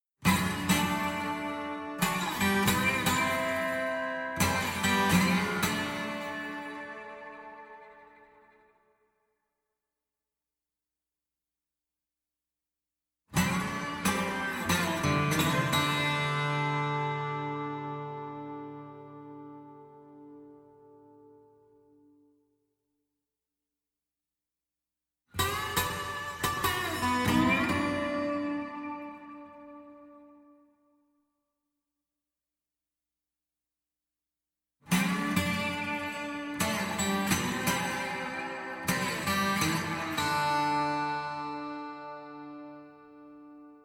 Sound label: dustbowl rattle D minor guitar only